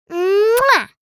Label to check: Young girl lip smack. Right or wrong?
Right